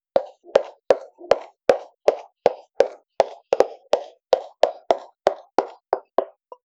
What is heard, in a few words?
clean isolated sound of a horse